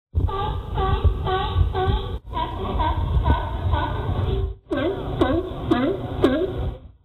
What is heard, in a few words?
sea lions bark